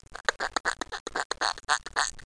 SUCKING

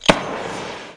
TORCH LIT; Messiah; WEAPON

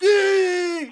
plyr ht; quake; halo; hurt

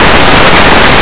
book

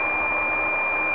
squeal; M4 7.5.2; M4™ (PowerMac)

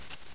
rustle; 3D Game Programming All in One

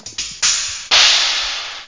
cymbal; Amiga-CD 1996 #5-6; sampler; Instruments; Amiga Magazin; Amiga 8-bit Sampled Voice

